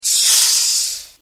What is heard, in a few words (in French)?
polisson aeroport